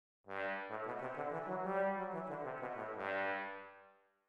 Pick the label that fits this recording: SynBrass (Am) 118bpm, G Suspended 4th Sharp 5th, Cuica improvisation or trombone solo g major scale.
trombone solo g major scale